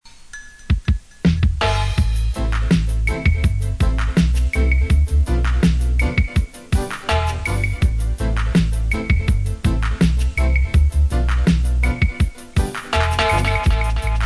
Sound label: tom toms dub